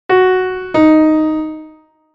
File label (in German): Intervalle sukzessiv